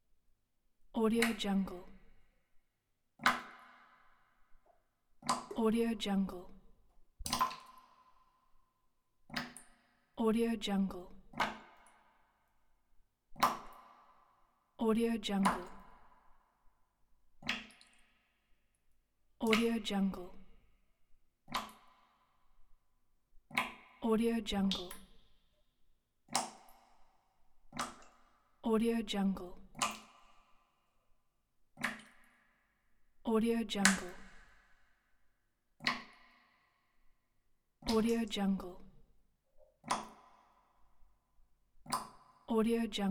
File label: water drops; satyrsound